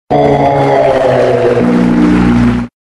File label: zonk; cstrike; forlix